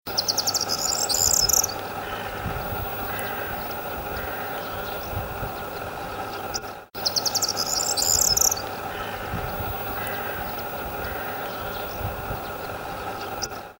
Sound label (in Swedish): Kornsparv; N Lyngen